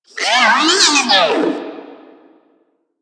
Necramech Repair Drone Idle Surprised; Warframe

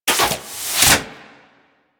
Mods Sleep To Working; Warframe; Liset; Hub; Machines; Tenno Mods

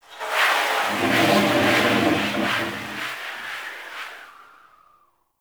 void storm wind gust; Warframe

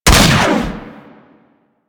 Fire Shot, Rifles; Snipetron

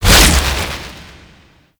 Infested Hard Drive Cudgel, melee attack; Warframe; Infested Lich

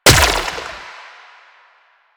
Grenade Bounce; Warframe; Lasrian; Lasrian Soldiers; Weapons; Lasrian Chem Nox

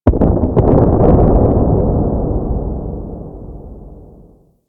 Fortress Distant Asteroid Impact; Ambience; Warframe; Grineer Fortress

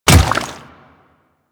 Water Step, Footsteps; Royal Guard

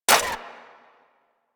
Pistol Mech; Warframe; Weapons; Thanotech

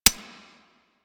Pistol Mech, Weapons; Harlequin

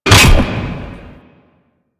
Thumper Bot Jump C; Warframe; Grineer

